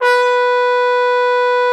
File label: B4, TRUMPET; Xsample VOL-3